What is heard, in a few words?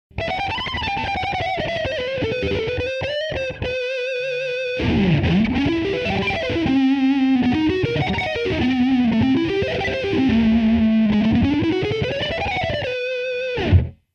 495R lead, gibson